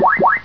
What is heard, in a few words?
arcade, ambience; svencoop; azuresheep